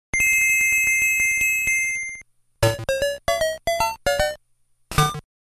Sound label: bell level clear!; Super Mario Land 2 - 6 Golden Coins